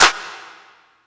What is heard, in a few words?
Hand Clap, Yamaha PSR E305